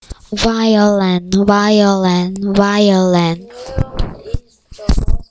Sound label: violin